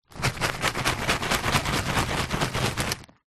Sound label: food potato chips crisps bag shake crumbs